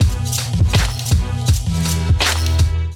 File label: Kit groove 81 bpm, Drums; MIDI Clips; Blueprint; Ableton; Chop and Swing